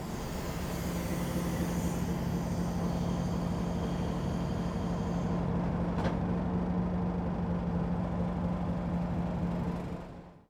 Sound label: train diesel x class tasmanian idle venting; tgr; 1951